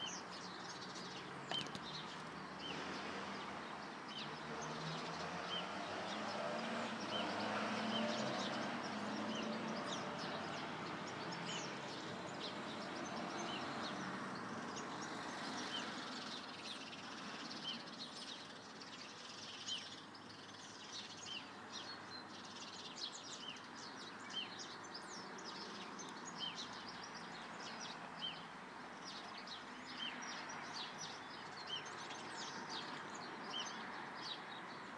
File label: goldfinches sparrows outside my window